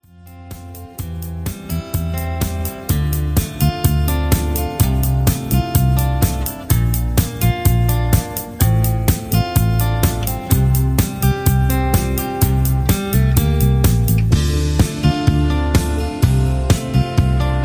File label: Instrumental No Leads